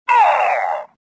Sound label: pain; Star Wars Jedi Knight II Jedi Outcast; XBOX; shadow